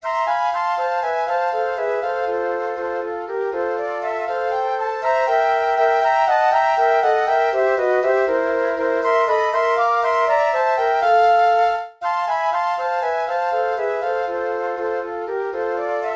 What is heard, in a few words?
Mexican Hat Dance Flute Trio